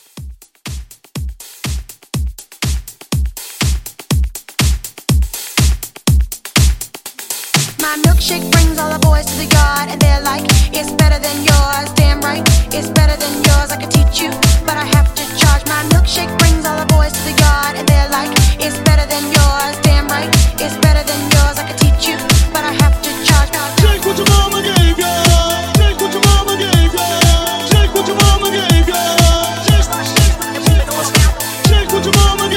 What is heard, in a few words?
Club Hype 122